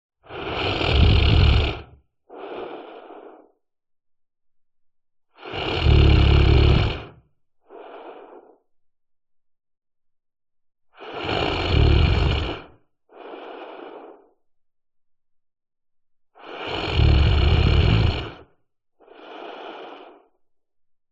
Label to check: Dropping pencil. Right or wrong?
Wrong